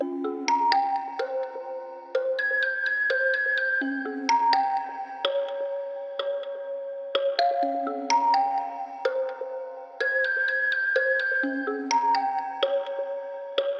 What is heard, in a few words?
126 BPM C Phrygian Bells; Stems; Cymatics; Deep End